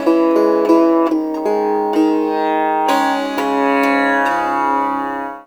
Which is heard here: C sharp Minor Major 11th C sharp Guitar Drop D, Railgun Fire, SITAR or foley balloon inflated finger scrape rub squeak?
SITAR